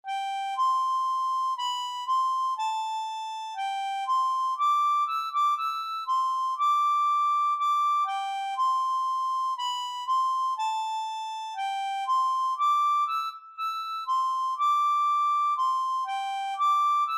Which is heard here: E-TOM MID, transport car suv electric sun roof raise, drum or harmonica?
harmonica